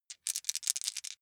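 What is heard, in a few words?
small four rattle in hand short, dice; wuerfel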